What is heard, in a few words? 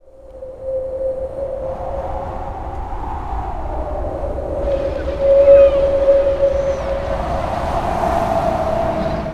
WIND; Best Service - XXL Production Effects; WIND+STRM